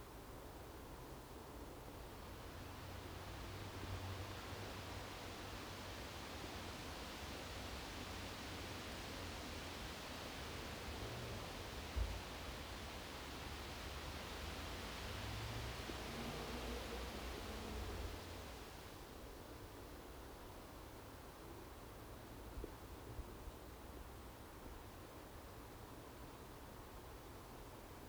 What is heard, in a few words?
ambience park